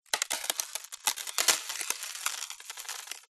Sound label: nature ice crack close up